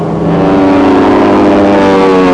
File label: stuka